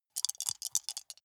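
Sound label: small three rattle in hand closed short, dice; wuerfel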